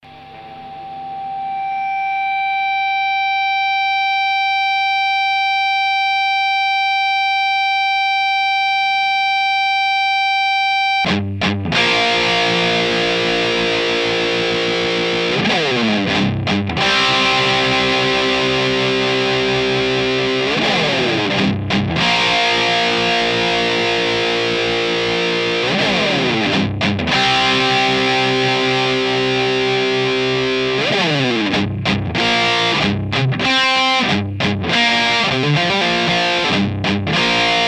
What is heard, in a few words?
Rythm bridge